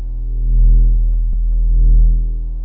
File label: array hum